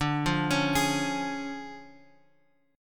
D Minor Major 7th Flat 5th D Guitar Open G

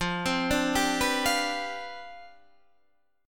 C Major 7th Suspended 2nd Suspended 4th F, chord

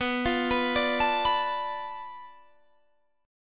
B Minor 7th Flat 5th B, Guitar Standard; arpeggiated; capo 9

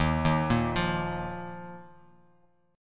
D sharp Suspended 2nd D sharp, Bass Standard; arpeggiated; chord; 11,6,8,10; JGuitar

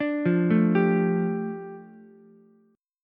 F 6th Add 9th F down Ukulele C6, chord; F6add9 arpeggiated

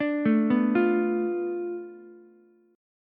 B Minor 7th Flat 5th B Ukulele; Bm7b5; arpeggiated; 7,9,7,8; JGuitar

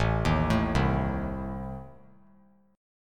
A Diminished A down; Adim arpeggiated; JGuitar

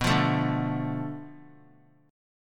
B Minor 7th Flat 5th B; Bm7b5; strummed; 23,24,24,24; JGuitar

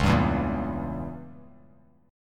C sharp Diminished 7th C sharp down Bass Standard; C#dim7 strummed; JGuitar

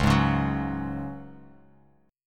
Db Suspended 4th, Bass Standard; 9,11,11,11; strummed

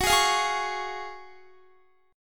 Gb Major 7th Suspended 2nd, Guitar; GbM7sus2 strummed; JGuitar

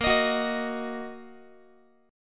Bb Suspended 4th Sharp 5th Bb down Guitar; Bbsus4#5 strummed

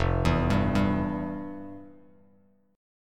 F Major 7th Suspended 2nd, Bass Standard; arpeggiated; JGuitar

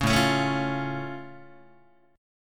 A Augmented A down Guitar; strummed; JGuitar

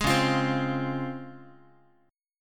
C sharp Major 7th, C sharp, Guitar; strummed; 11,9,8,10,x,x

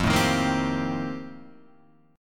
F 11th F, down, Guitar Drop D

